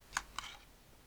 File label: pistol